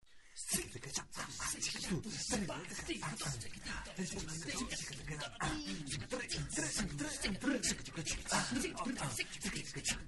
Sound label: mambo; traminer